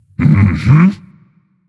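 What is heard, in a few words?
non verbal agree, voice; cernunnos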